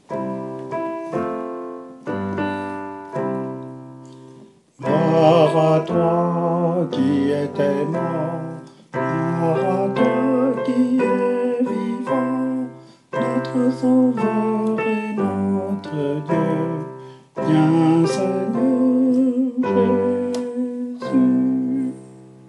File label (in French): Anamnèse; Messe du Peuple de Dieu